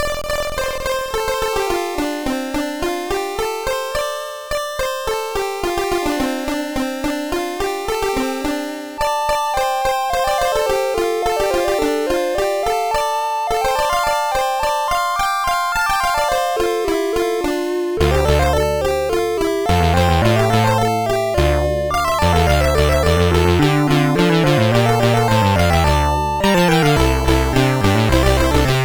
disk version CSG8580R5; sid

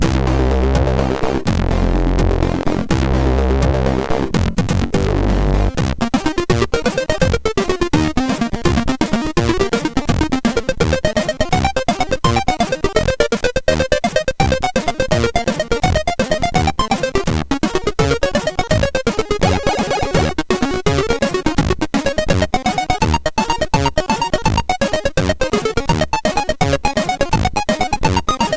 Fastfunk, sid MOS6581R3; hvsc

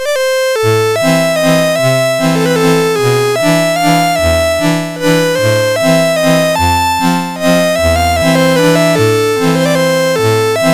Shubert Waltz, MOS6581R4

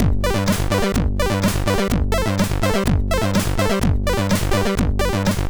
Sleepy, MOS6581R2

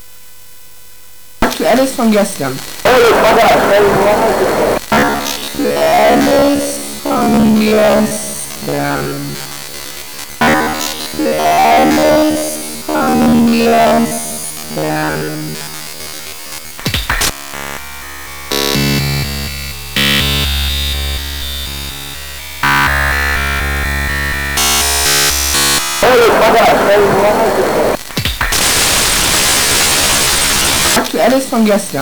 Stimme sid CSG8580R5

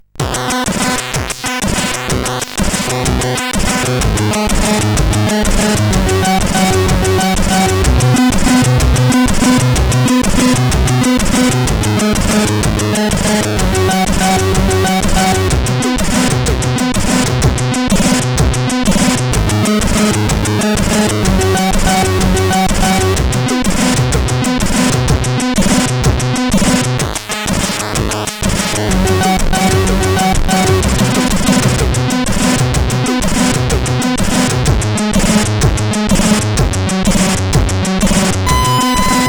CSG8580; Out of Noises

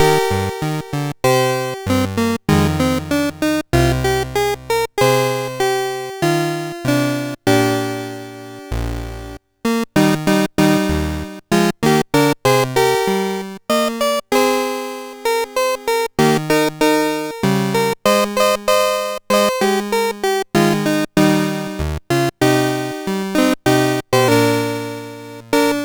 Shenandoah, sid MOS6581R3; Master Composer

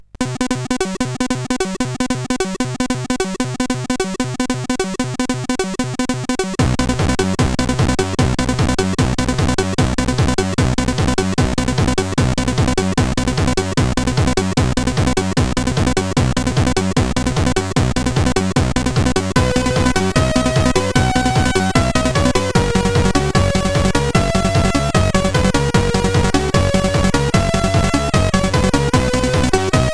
Can Can Dance sid CSG8580R5

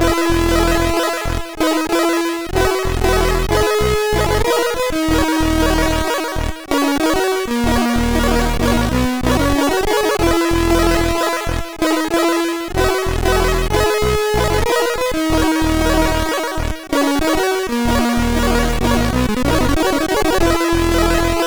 Melancholy, MOS6581R3

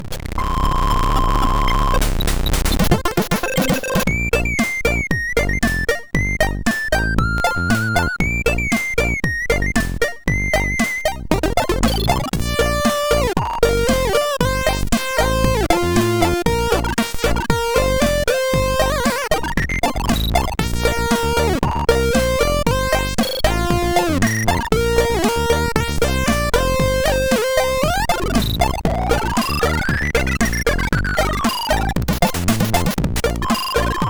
sid, MOS6581R4; Oboy vilken jaevla skaanska

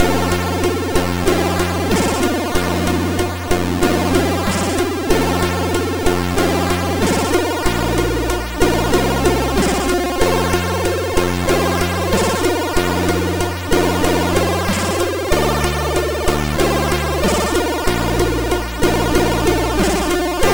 Heavy theme, sid MOS6581R4

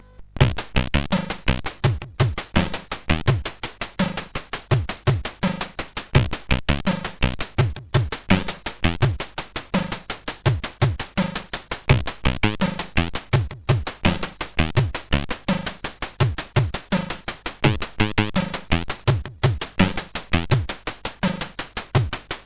Happy Birthday sid CSG8580R5

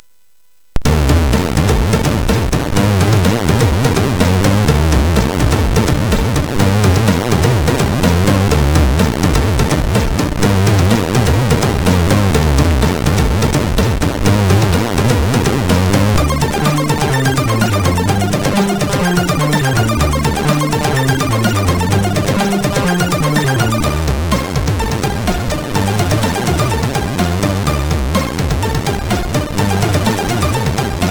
sid MOS6581R4; Tusari; HVSC

